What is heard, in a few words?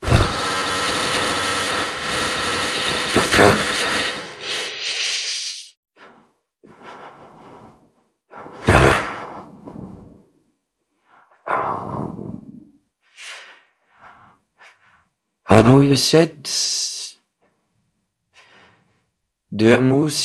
jingle